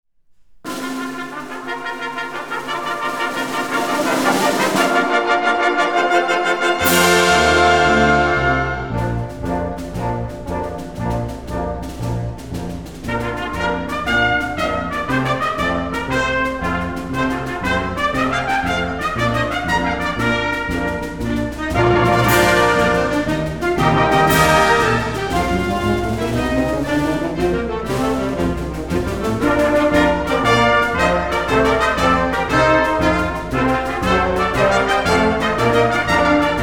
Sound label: IN BRASS; game music; super depth